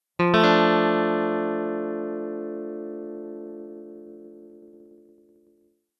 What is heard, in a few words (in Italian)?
ACCORDO SINGOLO; test di autovalutazione orecchio